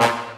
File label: short, Horns; Notes